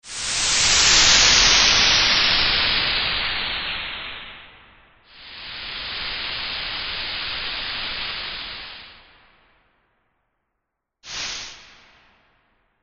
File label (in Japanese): 催眠律動音響 瞑想ブレス サンプル; ぴぐみょんスタジオ作品紹介